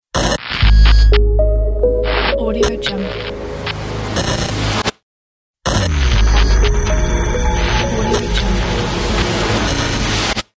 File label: clear glitch logo